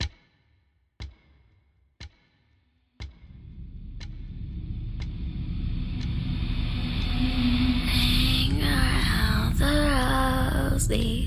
Ring Around the Rosie (Intense Creepy Child Vocal)